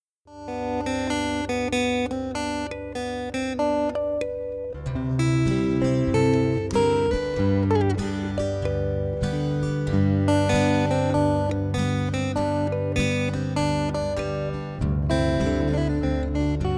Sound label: Moana Chimes